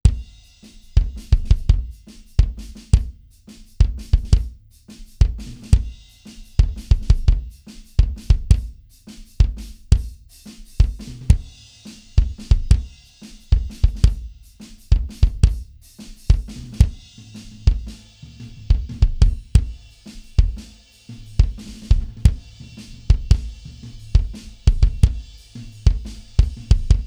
Drums

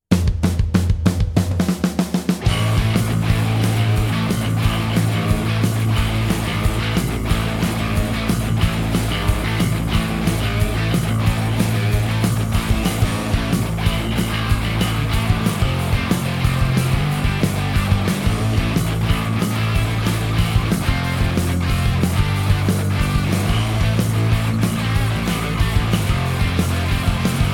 Instrumental Mix

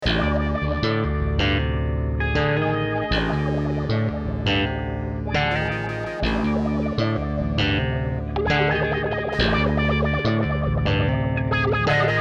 Sci-fi Lo-fi

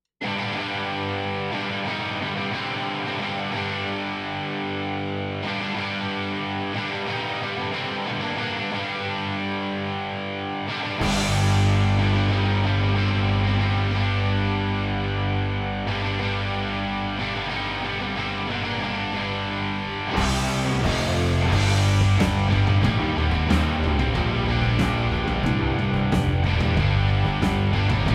w Guitars

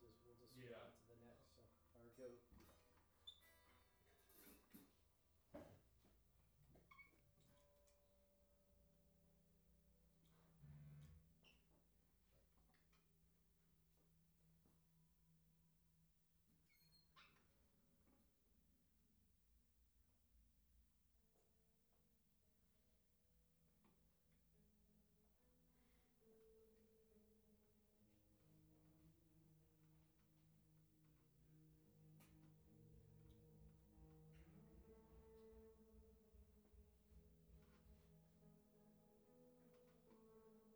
PZM omni drums

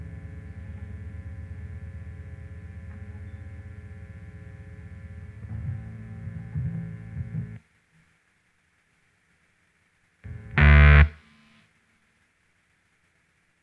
M201 Bass

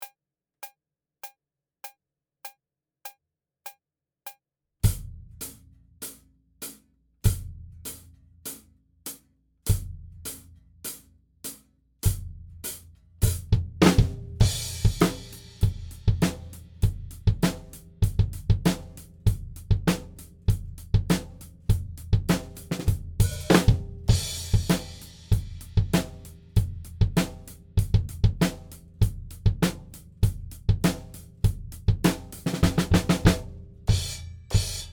Drums ONLY; Pre Pro

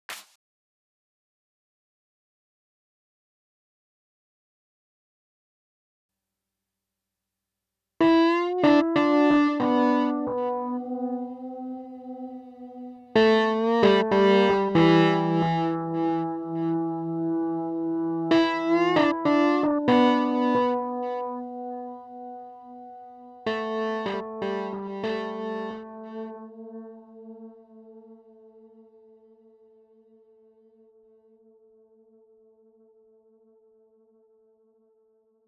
Keys

